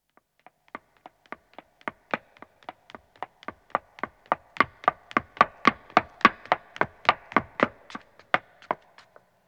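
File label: Stone Running, 1 Woman Approach, Footsteps; BBC Sound Effects Library; Footsteps II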